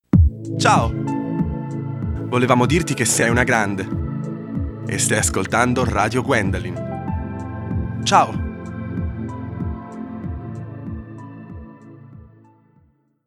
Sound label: Jingle